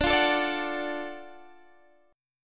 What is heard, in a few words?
F sharp Minor Major 7th Sharp 5th D